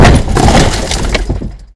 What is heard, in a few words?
impact wall medium; Kart